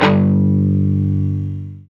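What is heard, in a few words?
guitar chunk mute; Club-50 - Foundations Roland; Funk & Mute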